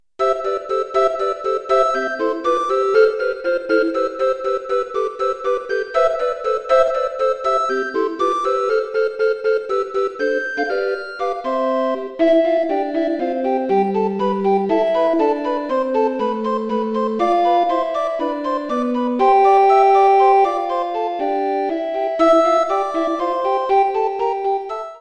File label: Jingled Bells midi